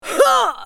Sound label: spell cast effort